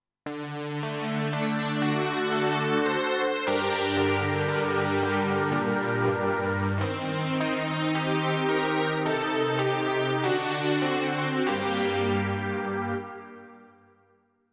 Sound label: Reso Strings